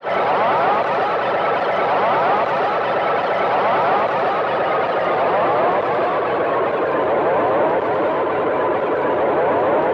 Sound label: Crude Tape Loop, Tape delay; radiosonics